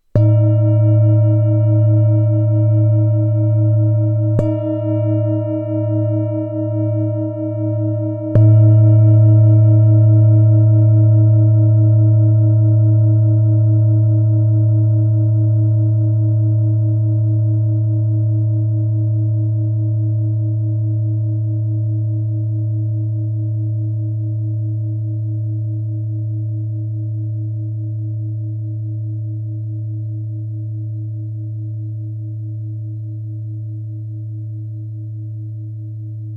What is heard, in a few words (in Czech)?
tibetska misa; g 2; 24cm